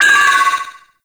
Vocal Pain; Warframe; Sentients; Sentient Trooper

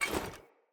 Foley Action Armour Run; Player; Deora Armour

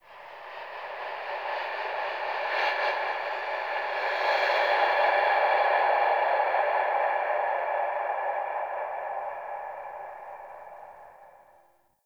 Haunted Breath, Ambience; Warframe; Shadowgrapher

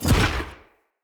Wolf Claw Melee Swing; Orengall

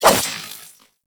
Swing, Weapons; Nikana; Warframe; Tenno; Revenant

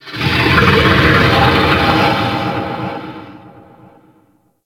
Mountain Beast Call; Warframe; Corpus

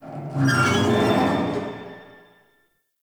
Galleon Metal Screech; Warframe; Grineer Galleon

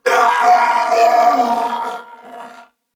Death, Male; Dialog; Barks; Battle; Corpus Venus; Warframe; CorpusVenusMaleA

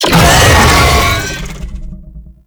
Infested Vocal Pain; Warframe

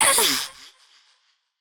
Girl Pain J; Warframe; Zariman; Skitter Girl

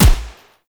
Assault Rifle Fire Initial; weapons; Warframe; Grineer

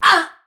Death; Warframe; Tau; Dax; Ninja

